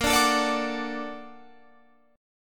Bb Augmented Major 7th Bb Guitar Open F, chord; Bb+M7 strummed; 22,21,21,21,21,21; JGuitar

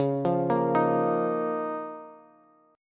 Db 6th Add 9th Db down Bass; Db6add9 arpeggiated; JGuitar

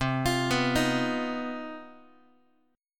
C Minor Major 7th Double Flat 5th chord, Guitar; arpeggiated; JGuitar

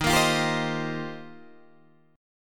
D sharp 6th Add 9th, Guitar, Open D

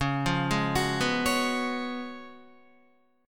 C sharp 11th C sharp, Guitar; arpeggiated; C#11; JGuitar

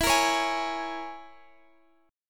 E 6th Flat 5th

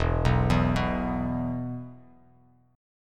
E 5th, Bass Standard; arpeggiated; JGuitar

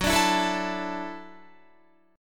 Gb Minor 7th Sharp 5th, Guitar; Gbm7#5; strummed; 14,17,14,14,16,16

